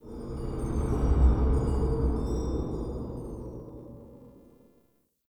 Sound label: Under City Ancient Wind